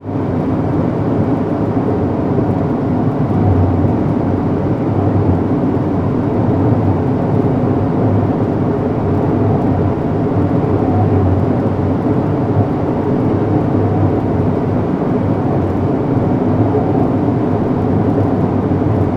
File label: ship engines; World Of Warships